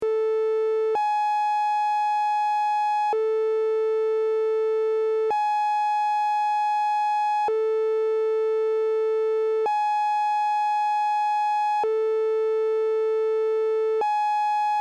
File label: Octave Pulse